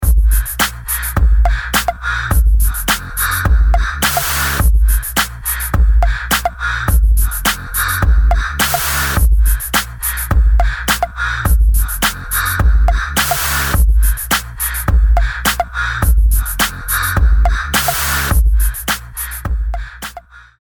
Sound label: DeepBreath, groovybeats ringtones; ghia productions